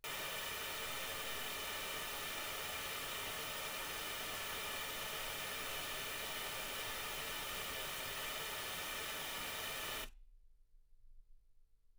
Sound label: pink noise 48kHz